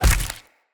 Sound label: Claw Large Impact Flesh, Enemy Unarmed; Weapon Impacts